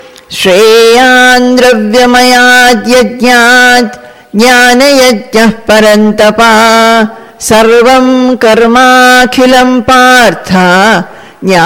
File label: Chanting